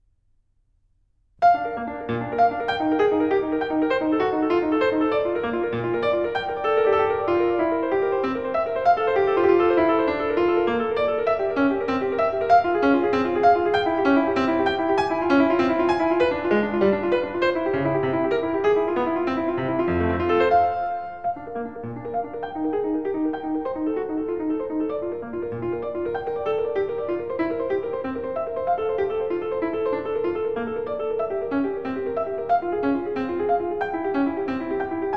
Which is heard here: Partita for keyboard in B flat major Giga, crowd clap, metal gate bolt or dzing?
Partita for keyboard in B flat major Giga